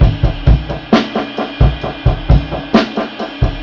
underwater echo, funky drum loop; Drum loops

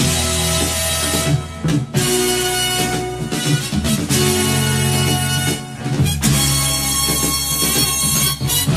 horns, jazz loops

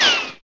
richochet, Zaps and Beeps

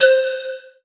flute glass pipe; Notes; Winds

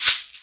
shaker

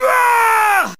growl, Voice

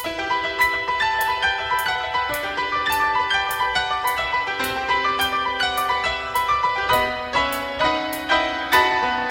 piano, Loops Sad

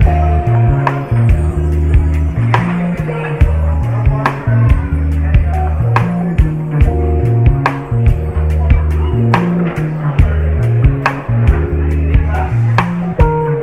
guitar lounge bar

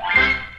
xylaphone strike, Stabs

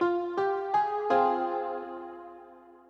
keys

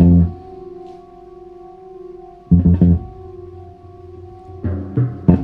bass, Sad; Loops

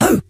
whew; ooh ahh; human

